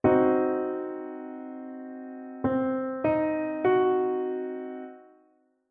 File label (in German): Dreiklang Dim Grundstellung